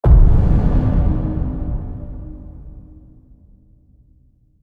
hit deep

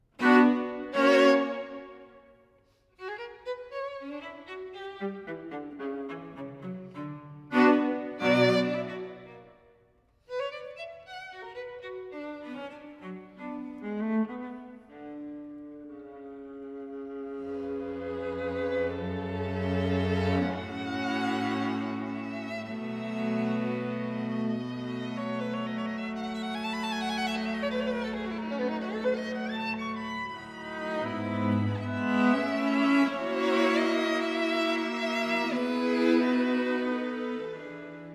allegro moderato, string quartet

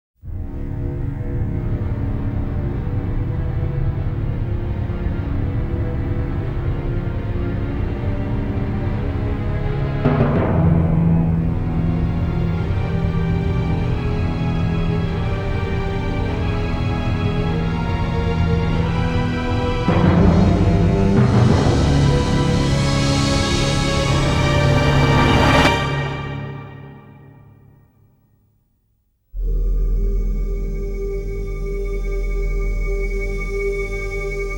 synth cue

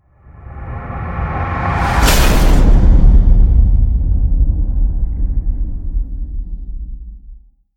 rise to hit ground strike

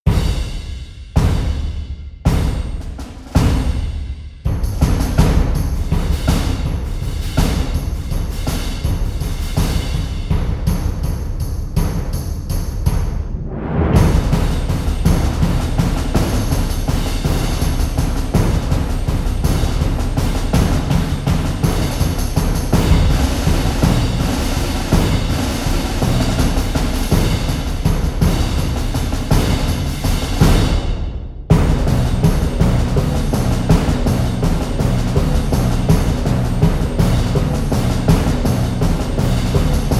drums

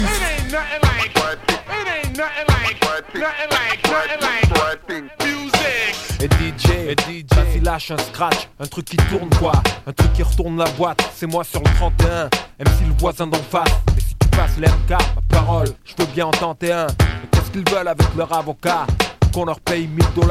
scratching music

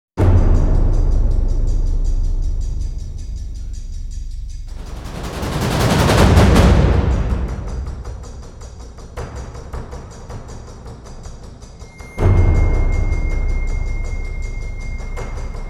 drums and perc

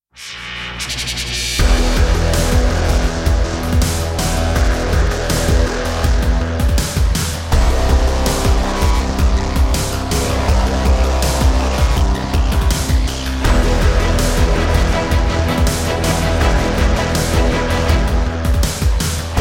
no choir

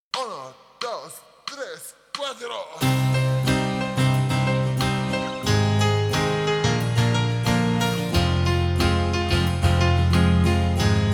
acoustic version

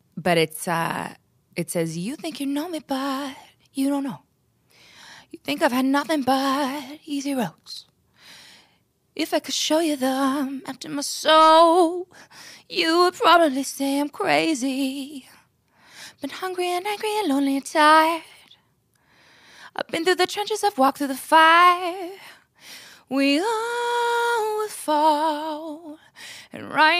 acapella

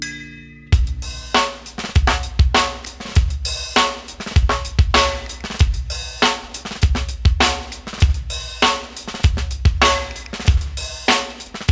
minuet

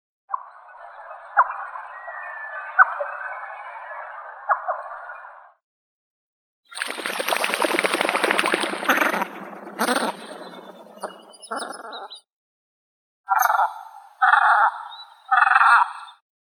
turpan gorbonosii melanitta deglandi

